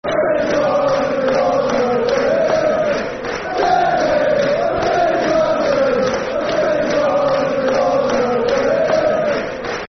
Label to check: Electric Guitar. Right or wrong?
Wrong